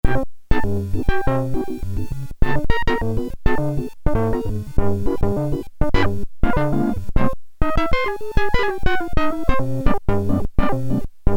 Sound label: Electric Organ, Realistic MG-1 Concertmate; audio examples from the Patch Diagrams